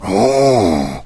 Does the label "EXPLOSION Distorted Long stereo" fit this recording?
No